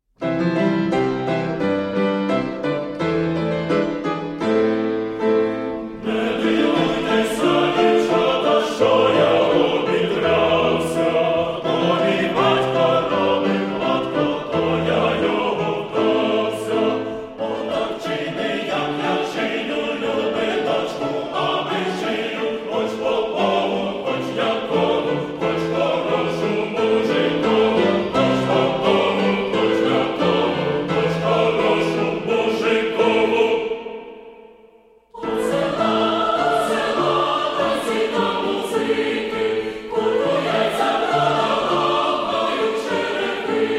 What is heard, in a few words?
instrumental